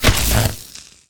flesh; combat; weapons; shootable; default shootable

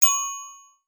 mapper

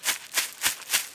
run, hunt; CosmicRage; CosmicRageSounds

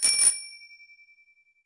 bell

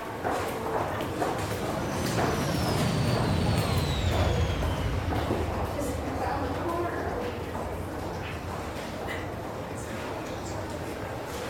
landing pad, ambiances

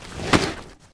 put, house

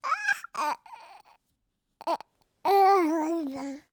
arm wiggle; CosmicRage; CosmicRageSounds; birthday

